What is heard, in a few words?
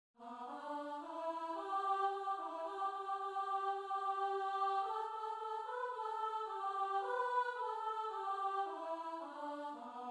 SOPRAN; DOWN TO THE RIVER TO PRAY